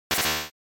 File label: General Noise